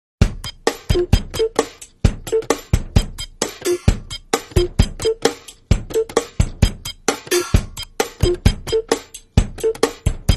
musica de brinquedo

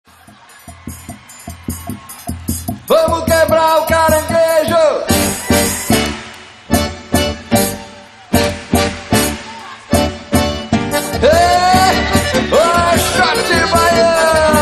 xote baião ao vivo

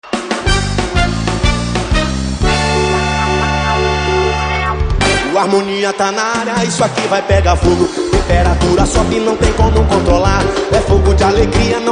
samba ao vivo em Salvador

